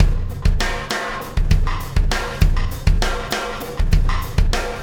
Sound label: Wormhole Beat, Processed Drumkits